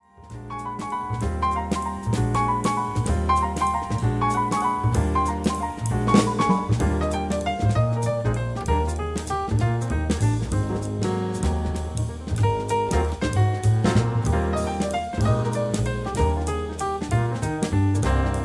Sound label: Trio